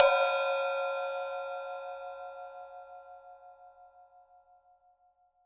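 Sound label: Hi-hat Acoustic open; Acoustic and Electric; Polyend; Simulacrum Sounds; 1 Shots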